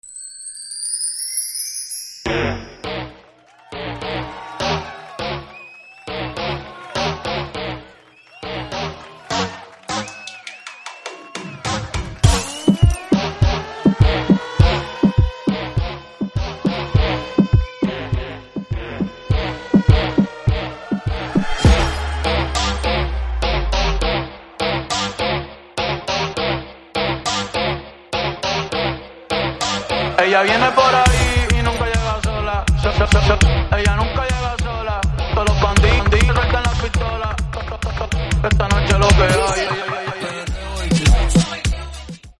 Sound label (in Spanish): Open Show Año Nuevo sin voz